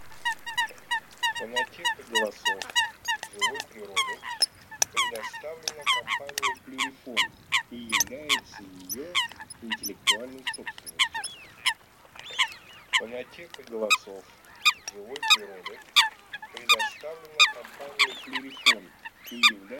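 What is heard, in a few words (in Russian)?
Лысуха Folaga, манок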